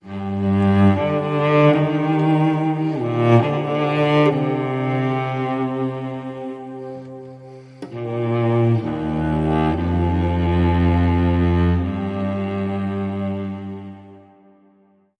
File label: Cello